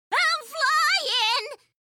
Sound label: flying